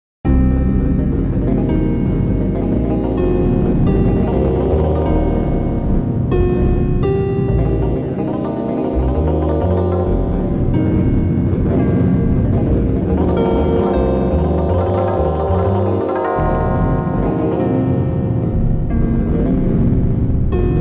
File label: eleven limit pentatonic tubular bells